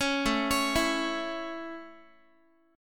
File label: A Major A Guitar, chord; arpeggiated; 16,17,16,14,x,x